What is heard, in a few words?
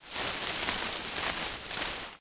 grass